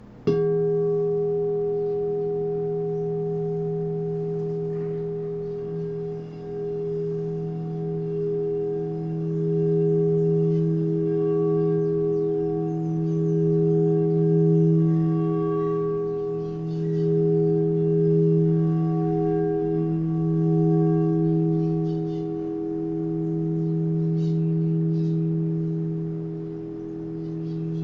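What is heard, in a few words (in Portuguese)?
FOSCA E3, instrumentos de quartzo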